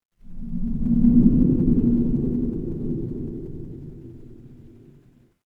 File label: horror wind whisper